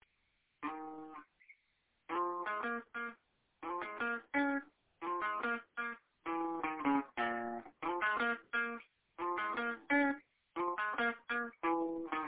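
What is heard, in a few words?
minor bluesy lick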